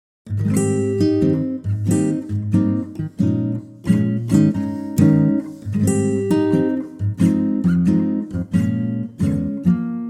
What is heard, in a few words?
Ab Acoustic Guitar